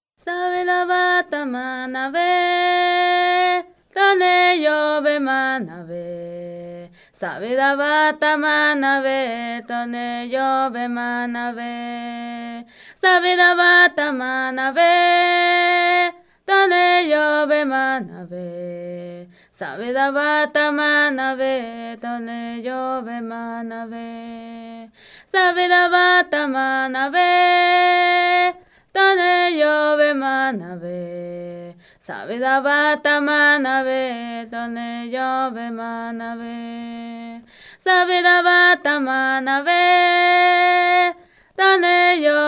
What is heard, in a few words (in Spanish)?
seris canto sin nombre